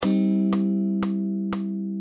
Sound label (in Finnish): C duuri bend